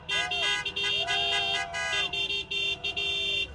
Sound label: Win